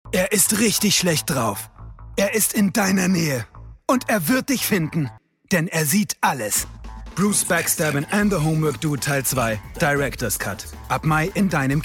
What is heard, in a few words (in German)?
action kinotrailer